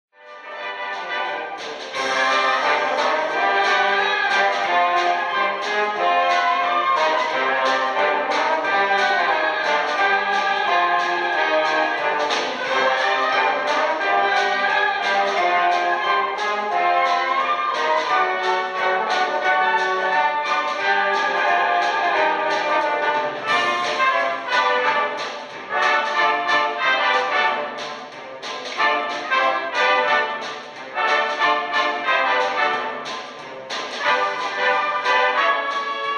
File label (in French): BEAT BLUES AND SWING